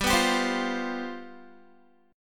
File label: G 11th, Guitar; strummed